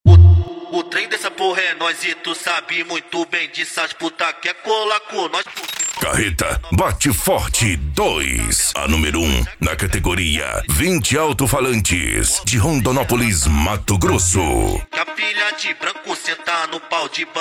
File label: midback e funk mega bass